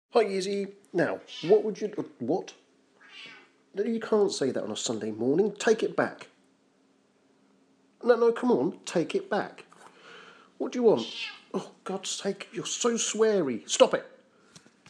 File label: the cat shouts abuse